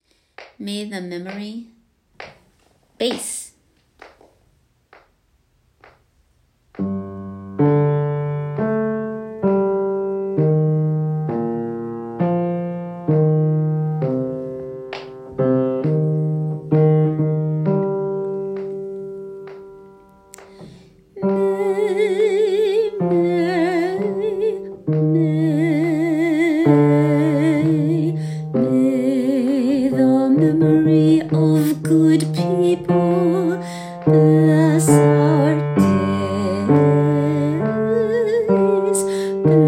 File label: Bass; Veteran Day Shabbat